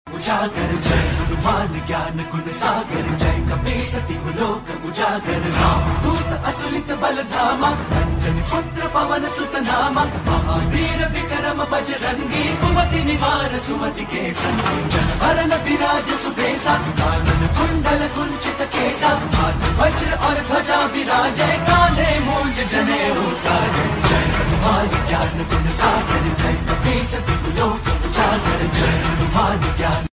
bhakti ringtone, Hindi ringtone; bajrangbali; hanuman ringtone

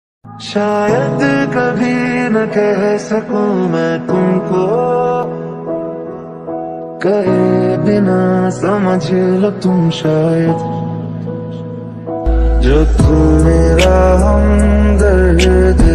best love ringtone hindi ringtone

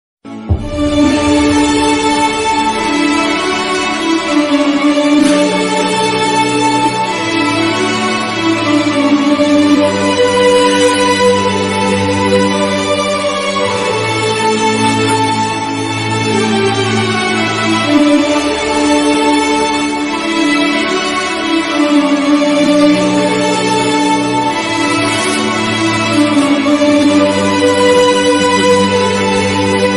instrumental; ms dhoni the untold story

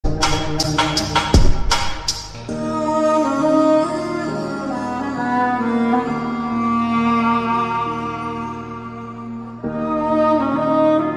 background song background music remix; tiktok

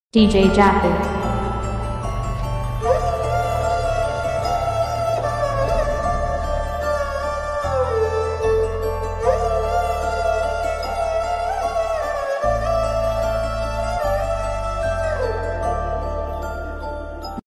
sad bgm ringtone; pichaikkaran 2

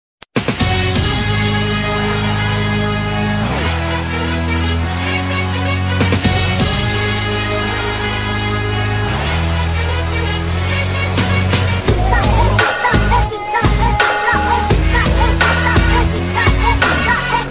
background music; maari bgm ringtone

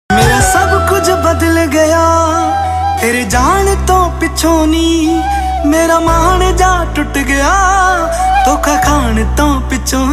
punjabi sad song status

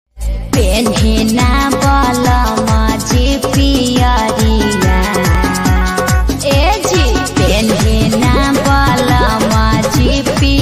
chhath puja ringtone

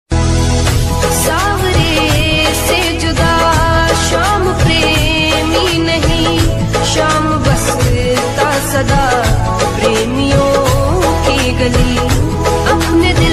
krishna bhajan ringtone, bakti ringtone

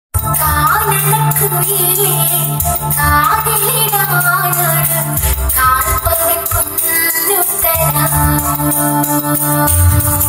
malayalam love song ringtone

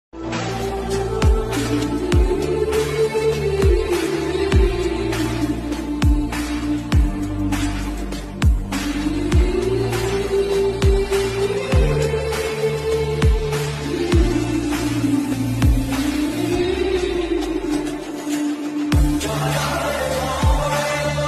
ringtone bgm; mahadev ringtone; mahakal ringtone; bholenath ringtone; shiv ringtone

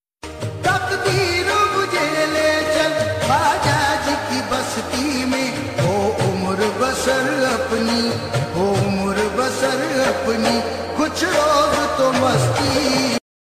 khwaja garib nawaz ringtone instrumental music

